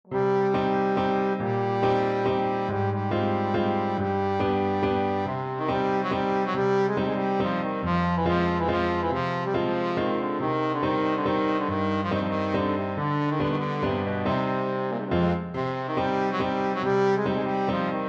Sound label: kujawiak trombone